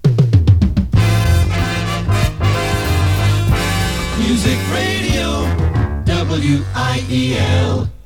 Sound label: jingle